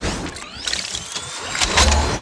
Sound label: Drone Attack; blue archive